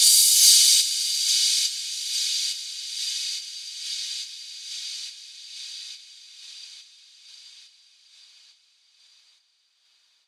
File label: Crash Cymbal 70Bpm; Lo-Fi; HipHop; Construction Kit; STEMS; Ghosthack; Ghosthack Advent Calendar - Day 4 - Lo-Fi Kit